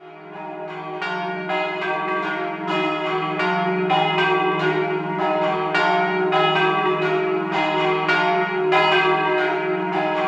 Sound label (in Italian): Doppio, campane; Subit